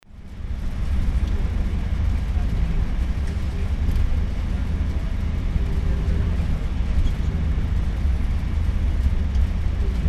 Car Essaouira

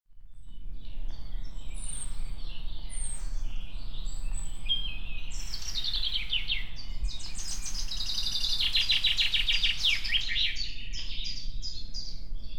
Oiseaux

